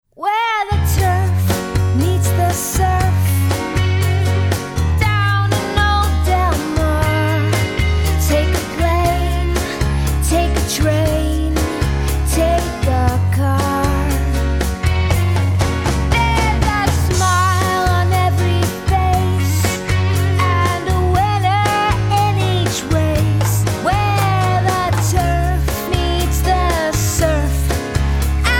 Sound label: Latin Rock